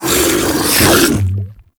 Grendel Bowl Devour; Warframe

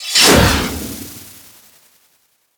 Slash Dash Blade Whoosh; Excalibur

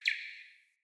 Cardinal Male Bird Single Chirp

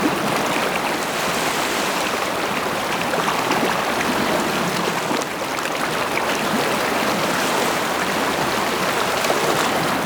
Water River Large Loop; Ambience; Props; Loops; Warframe; Venus Open World; Venus Landscape

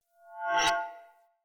Great Sword Accent; Weapons; Swords; Dendrit Ara Great Sword; Accents

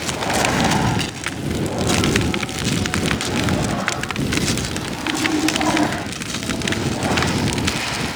Fire Trail Loop; Warframe; Nezha

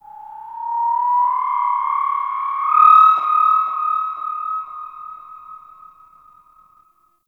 Sentient Texture Medium, Ambience; Sentient Lair

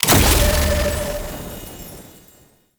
Defend Special Artifact Insert; Warframe; Missions; Sentient Defend

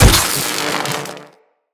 Electro Prod Hit Flesh; Weapons

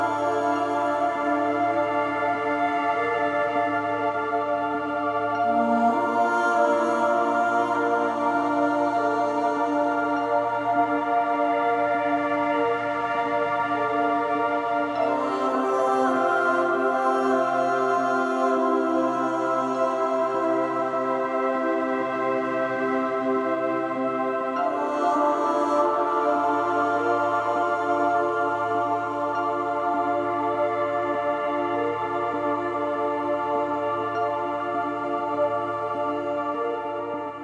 Scene Loop Music; Warframe; Cinematics; War Within

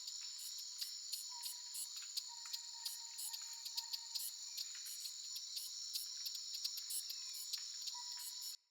Critter Loop, Ambience, Glades; Glades Of Andurin; Critter Loops

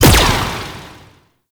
Galleon Turret Fire; Warframe; Ambience; Grineer Galleon; Vor Galleon Fight

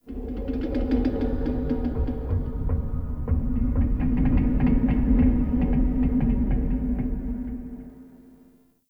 Metal Creak Medium; Ambience; Warframe; TheNewWar; Zariman

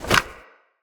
Remove From Back, Foley; Tenno Alt

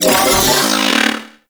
Riot Moa Vocal Pain; Warframe

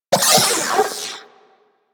Vocal Death; Warframe; Corpus; Mini Moa

